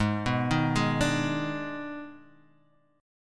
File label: Eb Major 7th Suspended 4th Sharp 5th Ab